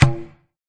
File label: urbantrap percussion tabla hit; Hip Hop; Urban Trap Kit; style kits